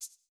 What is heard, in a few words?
menu navigate; action RPG game; Adventures in Lestoria; Sig Productions